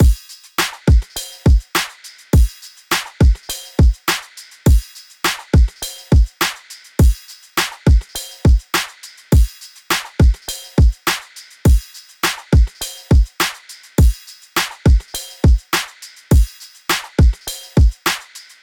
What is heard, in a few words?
Drum Loop - 103 BPM; Street; Cymatics; DOPE DRUMS VOL. 1